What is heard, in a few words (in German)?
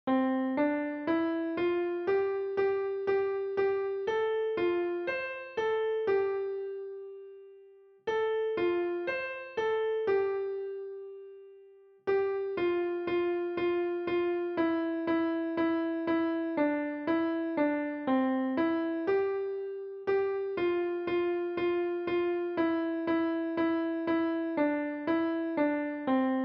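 Fuchs Du hast die Gans gestohlen Klavier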